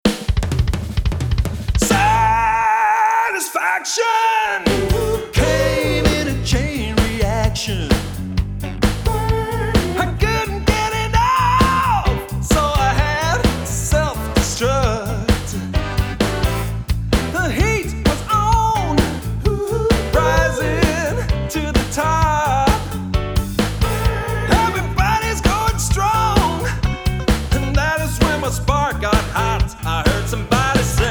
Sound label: Disco; Cool Cats Demo